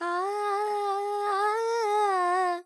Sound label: Pentatonic, Vocals, Carnatic Female, Alaps, Dry; Sample Banks